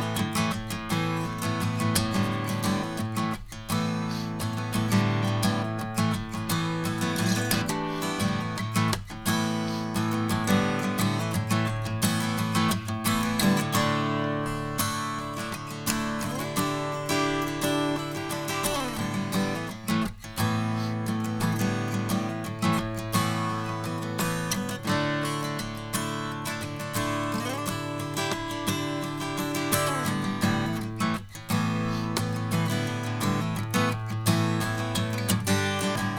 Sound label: Ac Gtr U87 EQ; Pro Tools Essential Demos; Digidesign